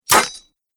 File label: Scimitar Pierces Chainmail Armor, Sword Sound Effects